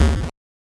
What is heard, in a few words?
8 bit failed hit